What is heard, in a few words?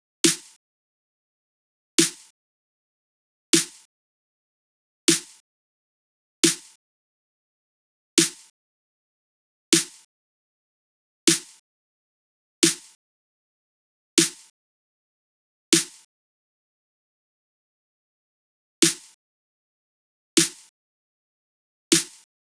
snares 155bpm; binary